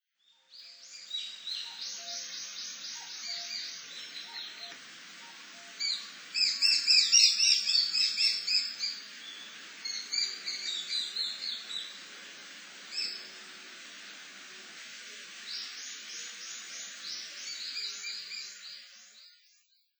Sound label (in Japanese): tumiyoutyou; utsunomiya